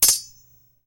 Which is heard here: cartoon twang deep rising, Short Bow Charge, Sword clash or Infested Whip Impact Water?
Sword clash